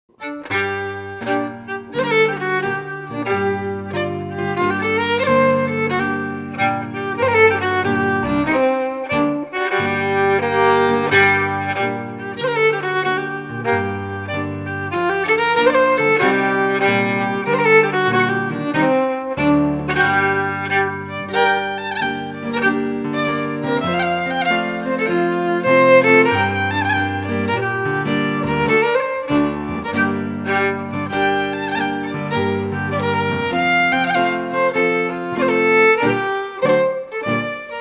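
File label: Strathspey, Scottish Fiddling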